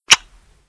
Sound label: kiss